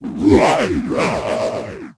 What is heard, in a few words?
FIRE RHINO